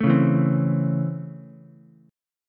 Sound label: Db Minor Major 7th Sharp 5th Db Ukulele; strummed